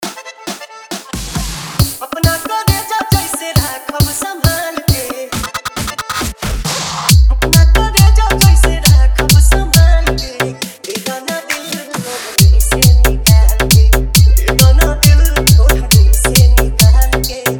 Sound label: Bhojpuri Dj Remix Songs Vibration Bass Mix